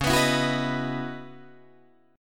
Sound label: Db Minor 11th, Guitar, Drop D